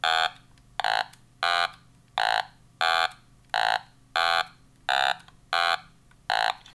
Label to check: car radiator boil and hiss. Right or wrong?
Wrong